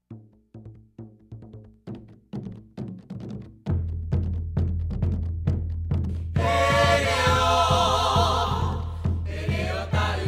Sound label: Samba